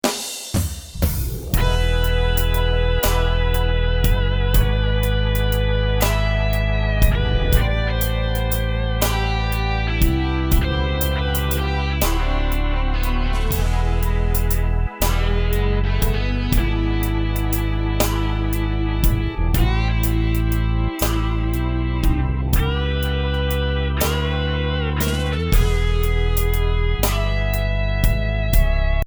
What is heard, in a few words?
Blues